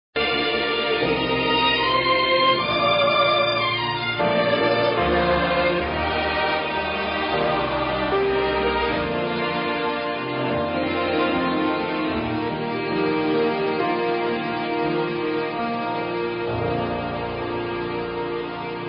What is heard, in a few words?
choir